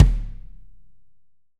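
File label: AMBIENCE KICK; AMB KIT#2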